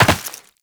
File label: Land Rock, Footsteps; Warframe; Tenno